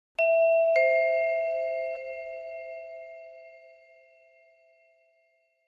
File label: Vibraphone Door Bell; mallets